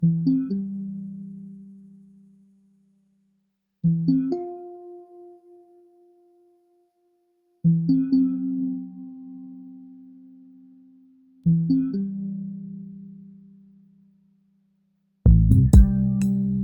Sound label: campfire cozy